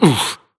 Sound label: grunt hits; Titanfall 2